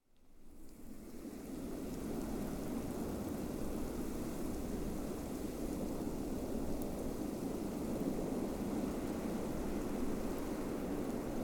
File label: spa snowstorm ambience snow falling on ground with wind